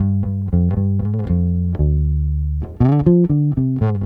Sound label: Bass, 70s Analog Funk